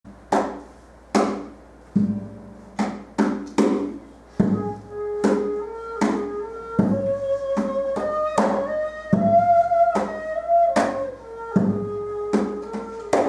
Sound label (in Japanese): 「短簫（タンソ）」という楽器です。; 韓国の学校教育にも取り入れられている、「短簫（タンソ）」という楽器です。; 細身ですがやはり7節があり独特の縦首振りによる激しいビブラートをかける楽器です。; 小学校・音楽鑑賞コンサート; 貝塚市葛城小学校